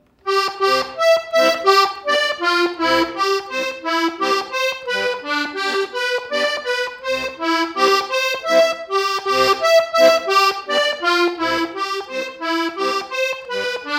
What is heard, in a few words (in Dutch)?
landler in c en g